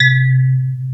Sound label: Electric Pianos; Wizoo Electric Pianos